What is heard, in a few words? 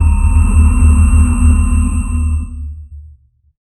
unstable ritual resonance